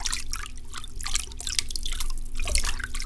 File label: Fluid Mouvement Slow